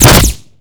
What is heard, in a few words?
shotgun silenced; weapons; ModernWarfare